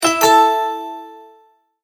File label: multimedia tone verified; Tones; Sound Design; McKinney Sound